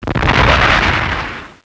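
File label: new explosion; GRIPEN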